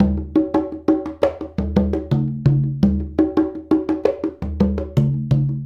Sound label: Congas; Essential Percussion